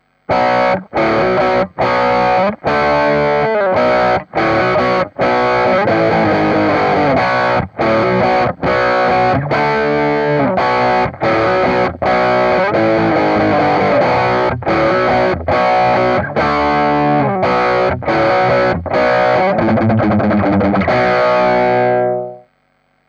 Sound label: Rock A